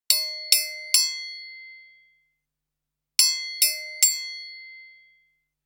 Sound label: Ding